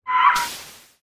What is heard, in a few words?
die; pets; pet eldrik